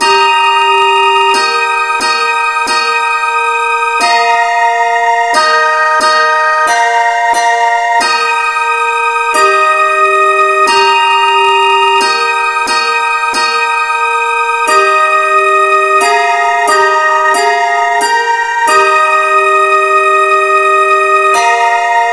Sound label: rev no vocal key e